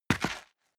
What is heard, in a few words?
step boots concrete grit single left; schritte; Footsteps